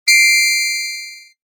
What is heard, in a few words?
Pipe